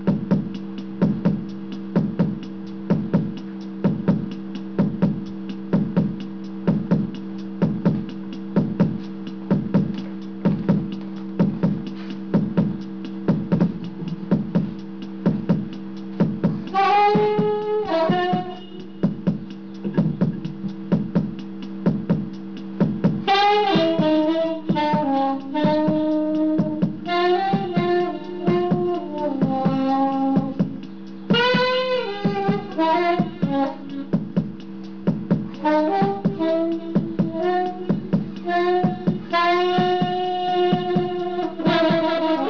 sopro batida

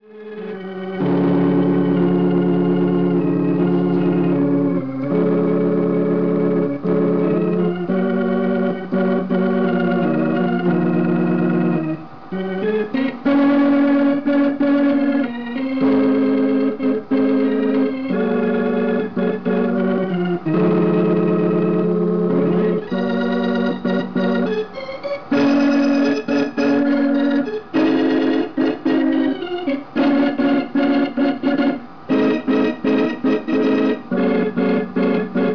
orgao

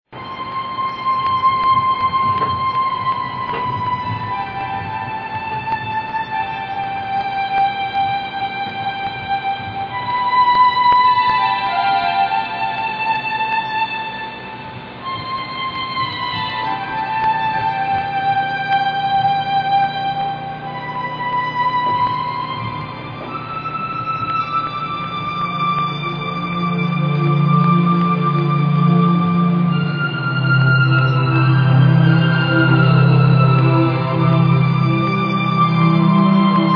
teclado lenta

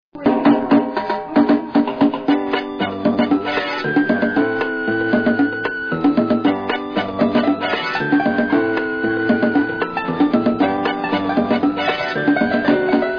ritmada

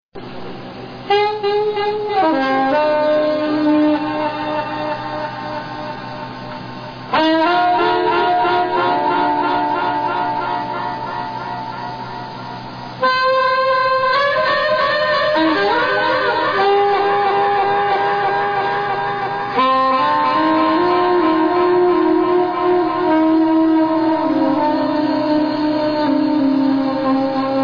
sopro

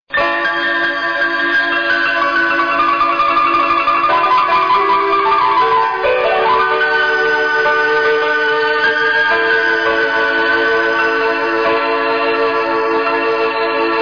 orgao

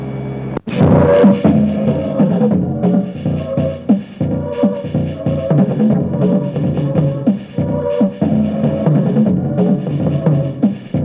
sinos na noite

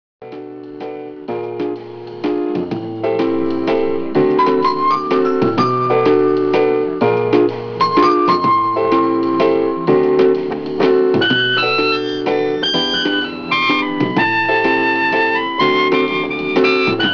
romantica

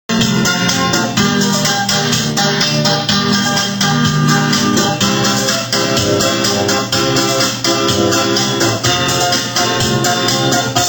assobio

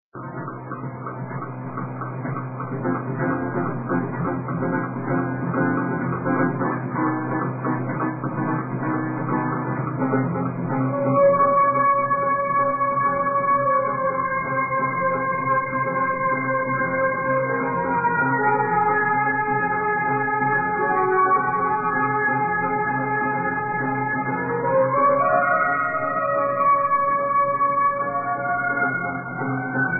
teclado

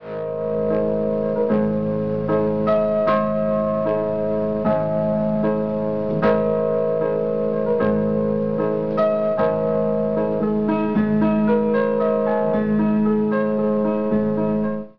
piano lento

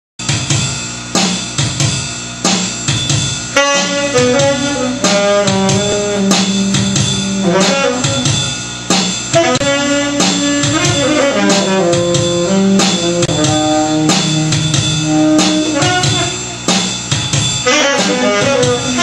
sax ritimo

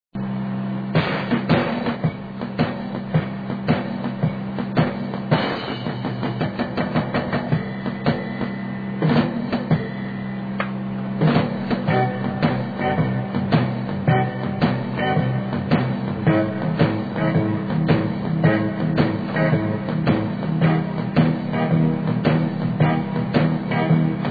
batidinha

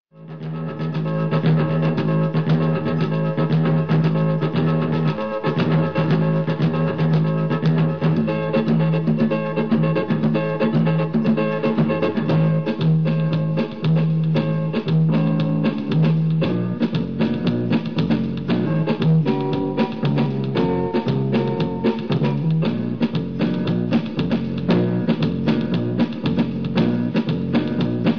ritmada piano

